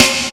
GATE SNARE

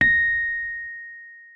A#6, Rhodes; Xsample VOL-1

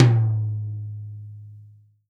tom, Real Drum Kit, funk kit

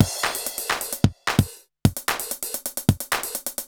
Alesis HR-16B (130BPM), beats

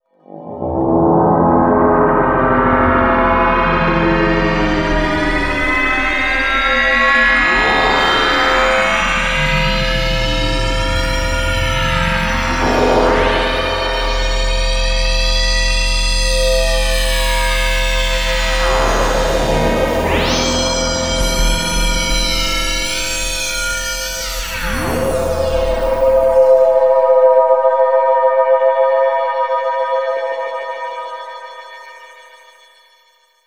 Atmo FX; Sonic Foundry (Sony Creative Software); Leo Cavallo Bass Taster; Special Fx

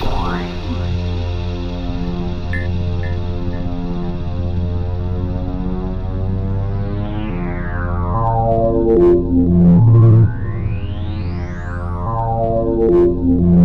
C2, SynthLine

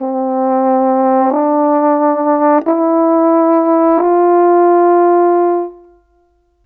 Horn, Cinematic; Sonic Foundry (Sony Creative Software); Orchestral Series VOL-3; Cinematic 24

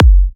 F# kicks; markus hakala kicks

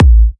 Kicks A; markus hakala

